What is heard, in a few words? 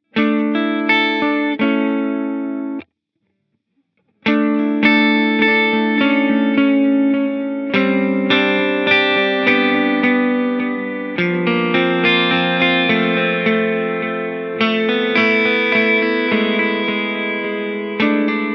Digital Dynamic Feedback